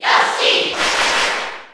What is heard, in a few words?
Cheer JP; Yoshi; SSB4